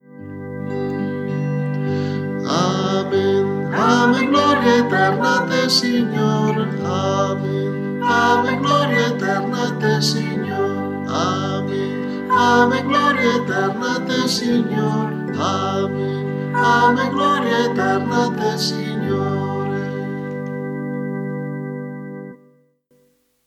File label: Amen